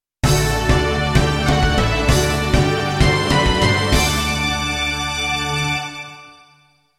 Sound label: jingle